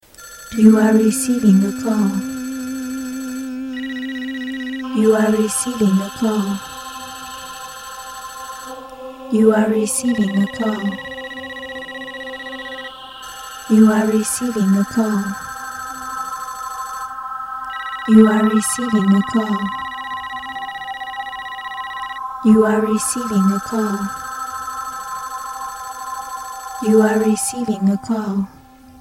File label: Receiving A Call